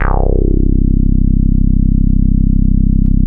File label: JX8P bassics C1, vintage keyboards; Big Fish Audio - First Call Vintage Keyboards